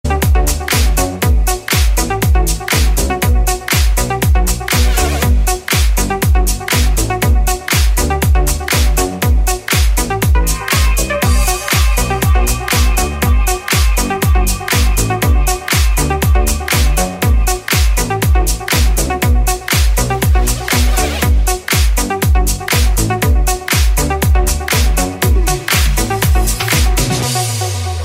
deep electro house ringtone

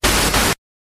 noise intro ringtone; cm punk

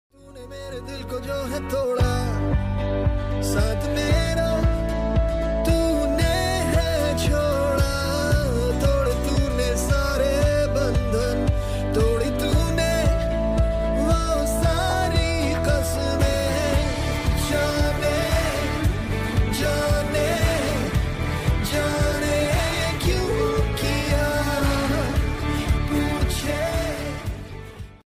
hindi ringtone

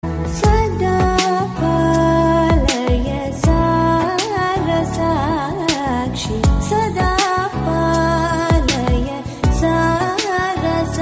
carnatic lounge ringtone

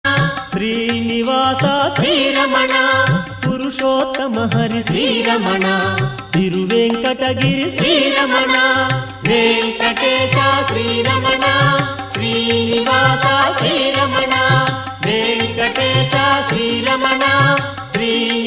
ringtone; sri venkateswara

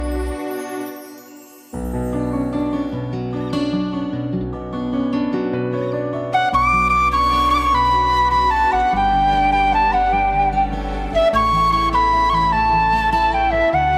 nice ringtone

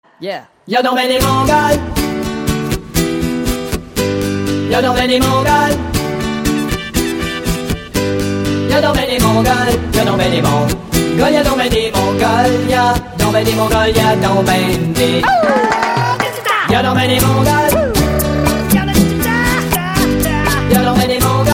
mongol ringtone

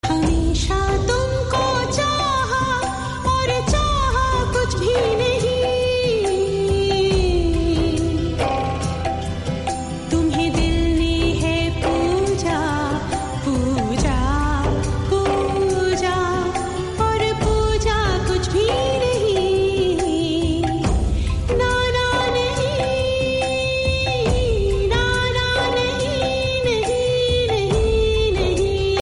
popular sad ringtone

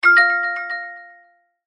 iphone x notification bell ringtone

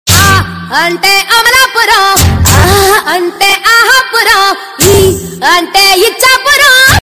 p smart ringtone

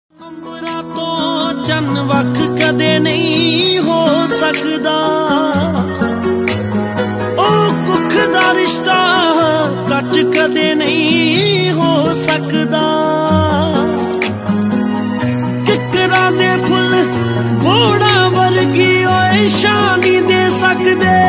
punjabi film ringtone

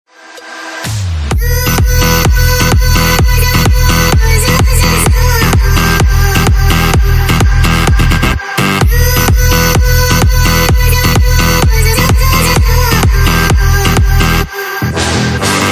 electro mix ringtone

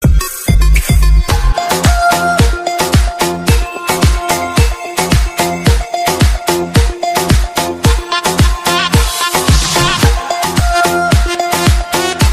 trance ringtone

